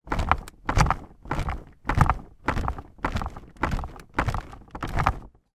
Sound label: household bed jumping on bounce recorded underneath; sound effects one